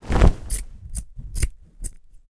empire game profession tailoring crafting scissor cut cloth; fabric